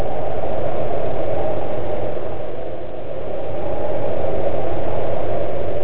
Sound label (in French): vent